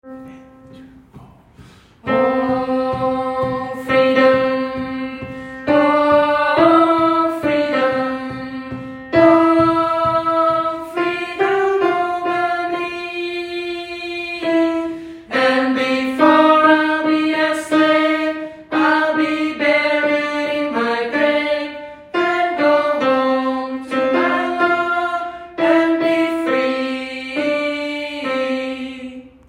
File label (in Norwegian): alt, gospel; Oh, freedom; kongsberg; kor; gospel for alle